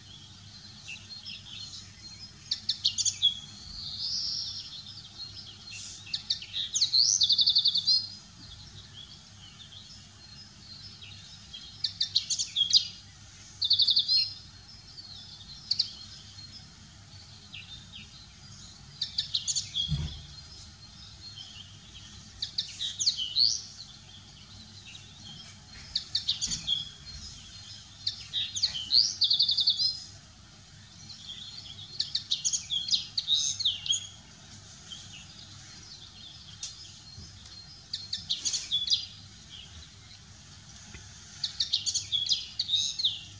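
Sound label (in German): bluthänfling; Stefan Wehr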